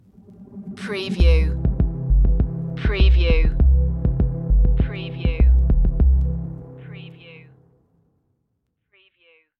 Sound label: underwater pulse stinger